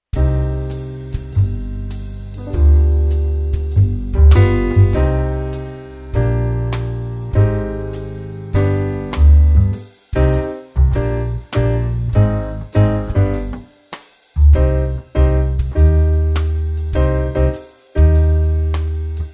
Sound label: Swinging